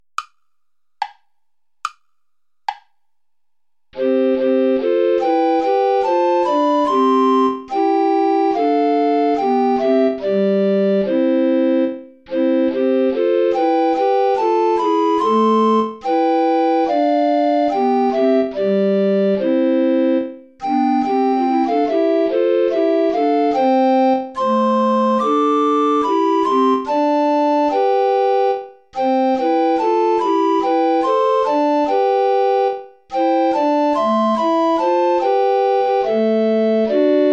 play along without alto recorder; praetorius michael nach gra ner farb mein herz verlangt

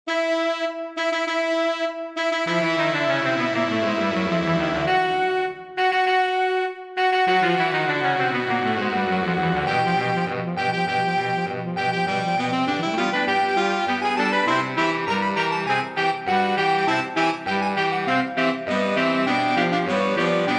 sax quartet

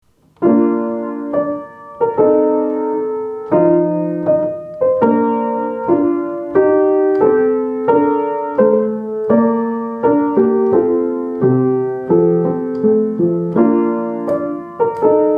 verset per organo; venti versetti

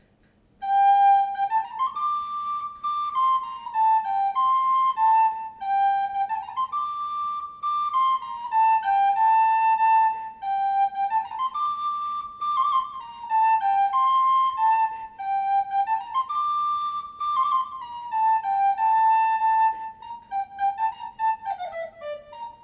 organ transcription

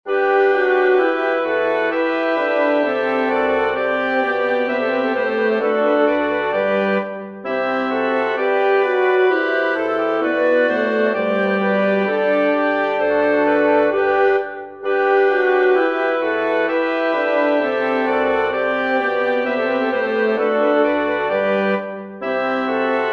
choral for wind quintet; bach johann sebastian; ich steh deiner krippen hier

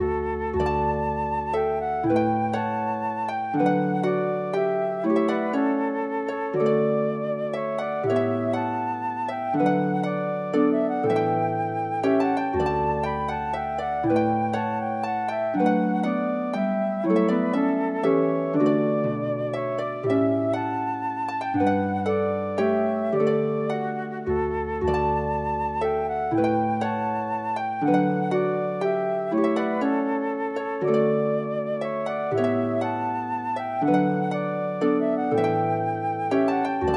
for flute harp

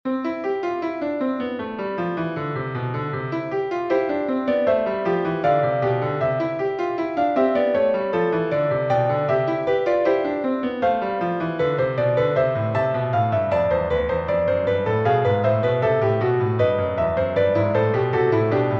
toccata for piano; berlioz louis hector; from works for the harmonium

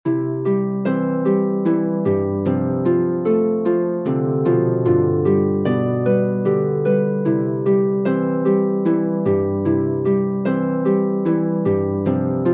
for flute oboe harp; the croppy boy

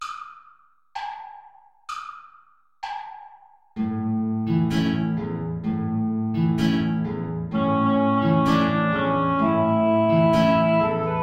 tango play along without clarinet; albeniz isaac

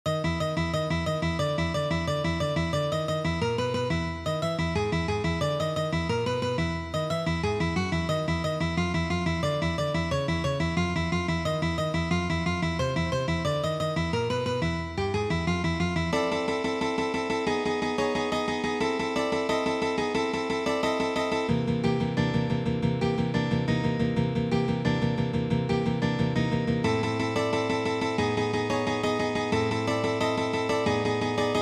etude burlesque; vihula michael

